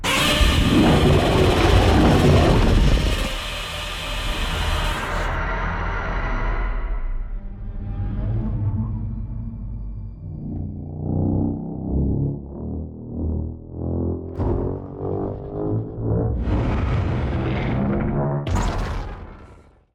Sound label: atomic breath; godzilla 2014